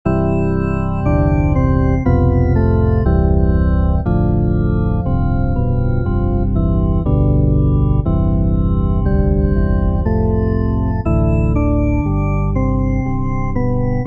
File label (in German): Wechselnoten